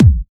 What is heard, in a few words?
Kick, Drums; Bonus Drums